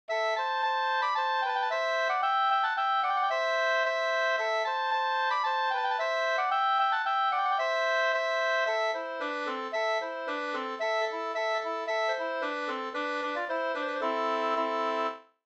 polka, 2st

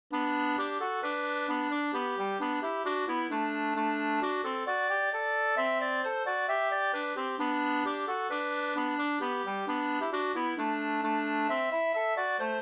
schottis lybeckare

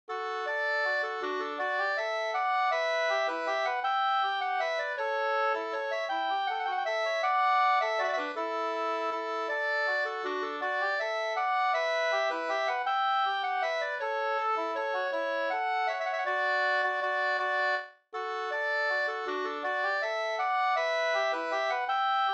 vals Ddur

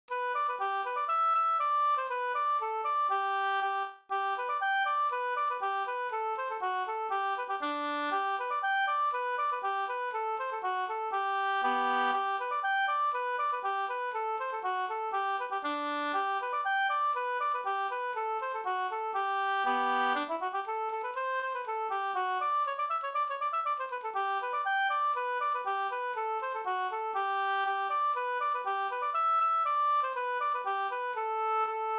folkdans, kadrilj; Landskronakadrilj

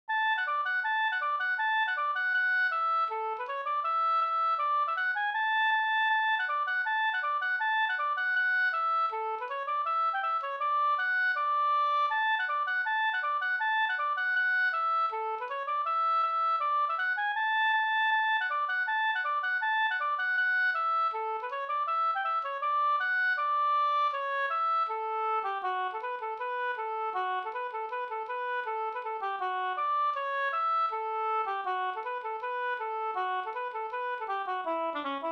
reinlender fr Norge

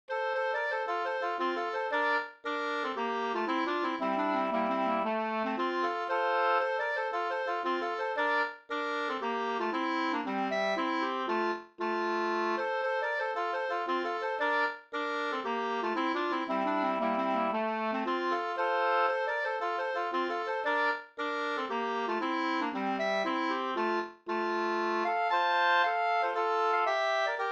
polska, 2st; e JO Goransson Jm

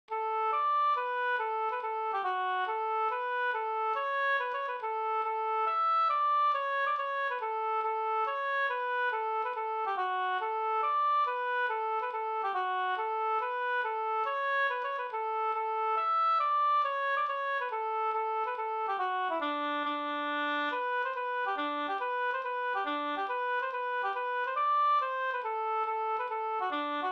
mazurka; Rot-Anders mazurka Musko Sd

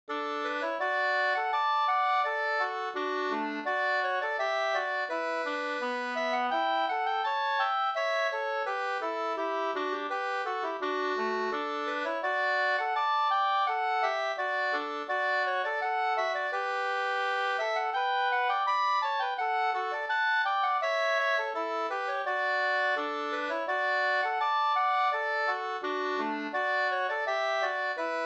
brudmarsch; av Bosse Johansson; Matfors

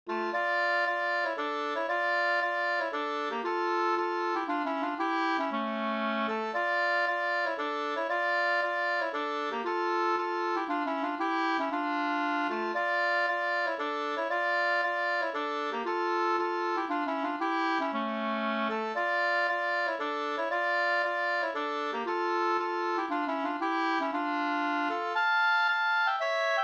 polska senpolska

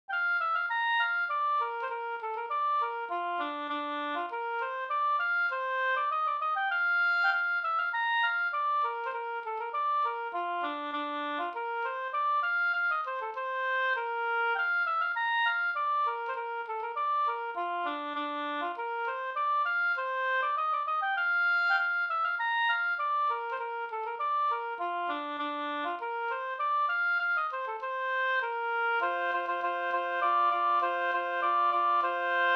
slangpolska

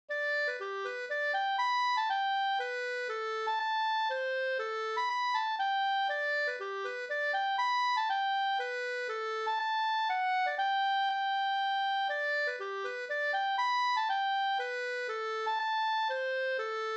folkdans Slangpolska; fr Skafto e Ernst Abrahamsson SvFolkdD2 p15

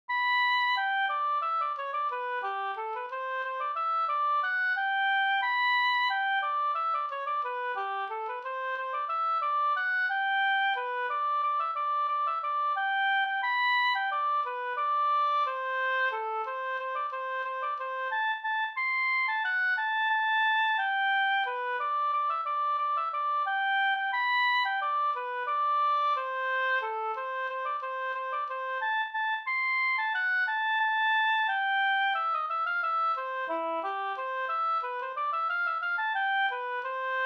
folkdans, Schottiskadrilj

